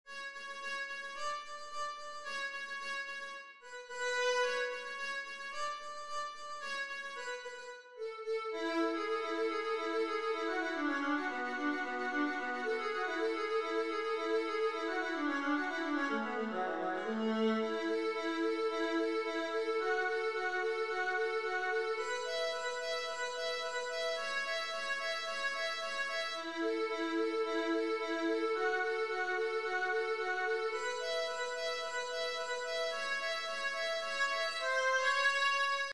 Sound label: fiddle easy